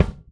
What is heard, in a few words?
Moderate, Kicks; Airwindows